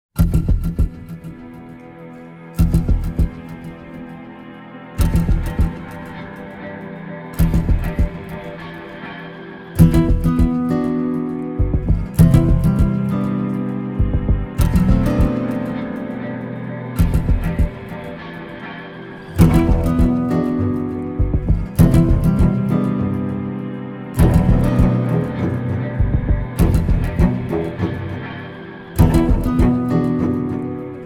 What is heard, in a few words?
Heartbeat